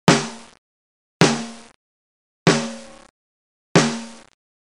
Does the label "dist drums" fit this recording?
Yes